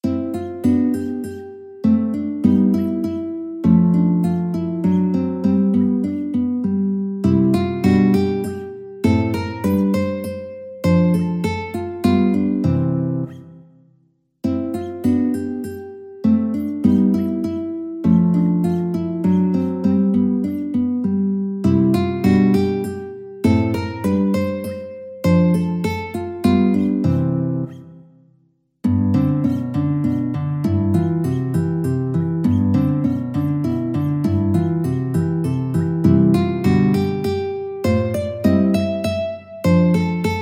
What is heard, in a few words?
Waltzes, guitar; Three Waltzes No1